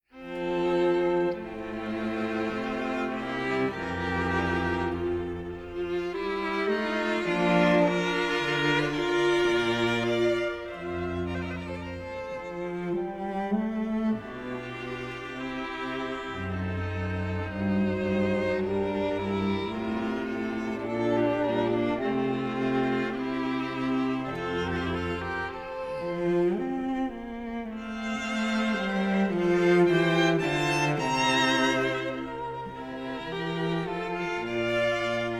andante cantabile from string quartet

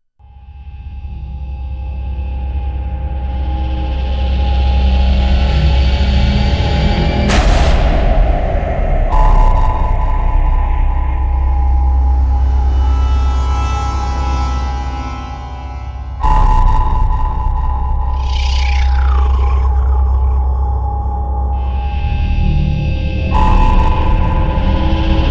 no percussion

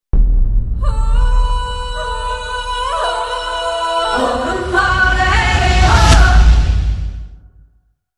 tribal yell

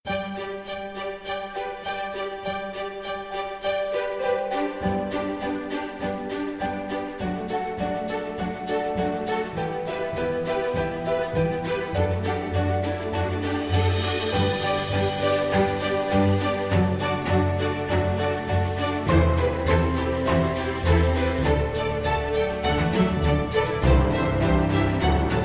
strings in the night

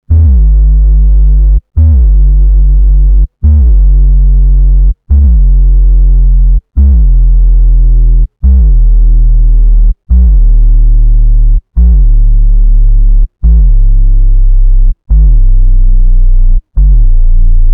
bass fx drop

